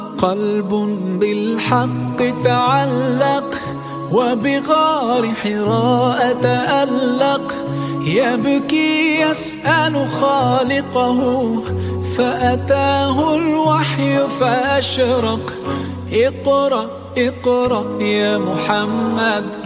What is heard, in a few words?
islamic tone